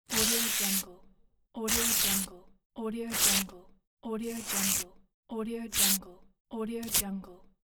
paper ripping

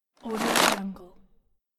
bag open

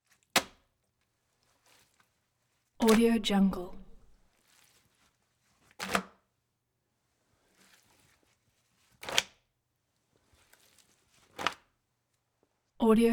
wet napkin in sink

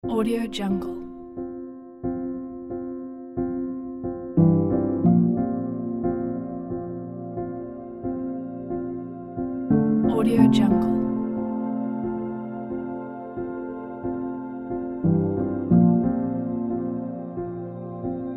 inspiring melancholy piano track; 1hanzo

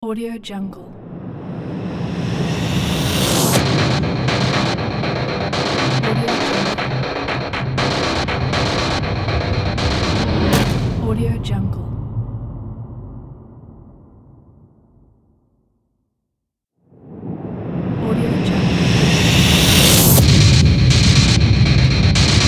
action rock logo; gkrieger

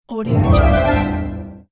mission accomplished notification

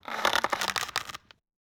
organic horror interface crunchy transition

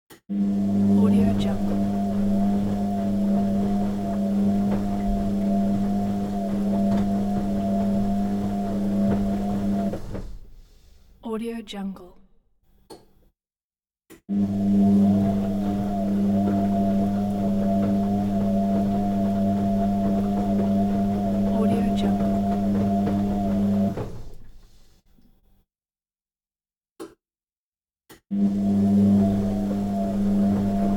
washing machine

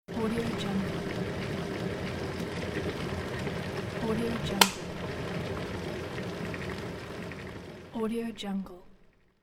electric kettle; jw-production

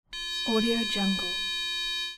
intercom signal; jdproduction

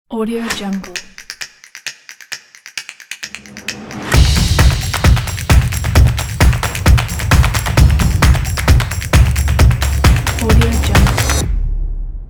claps logo pack

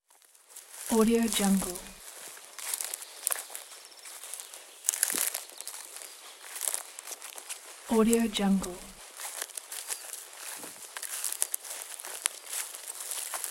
walking on grass in forest with birds

